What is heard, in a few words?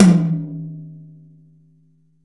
tom, Percussion; realdrum